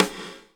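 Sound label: DISTANT ROOM, SNARES; InVision Interactive; Lightware VOL-1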